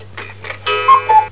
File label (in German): KUCKUCK